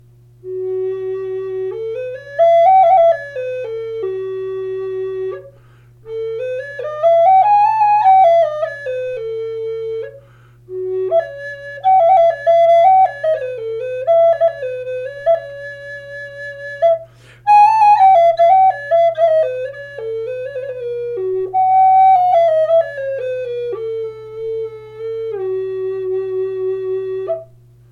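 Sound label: G4 Maple A430, Native Style Flutes; G4 Quilted Maple tuned; to A430; Ugly Boy Flutes; Mozart's preferred tuning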